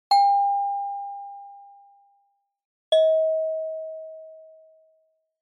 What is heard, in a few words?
Doorbell Mech Tone, Close